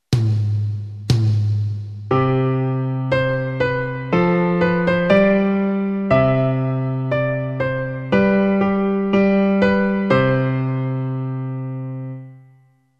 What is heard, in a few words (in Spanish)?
2 voces